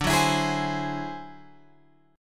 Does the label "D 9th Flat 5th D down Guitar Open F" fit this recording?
Yes